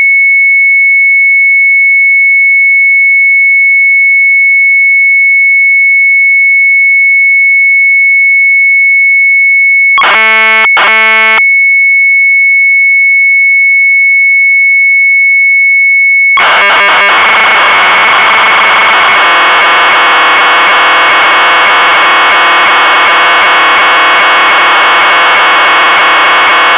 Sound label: MZ800 Tape, sharp; MZ-821 AUTO; retromelsarchive